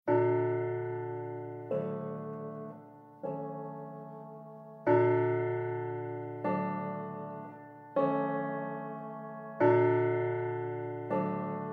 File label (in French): ETUDE POUR PIANO PREPARE